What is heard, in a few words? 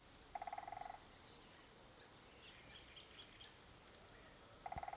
Bird